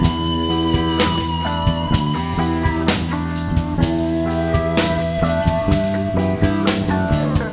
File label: 2 4 beat bars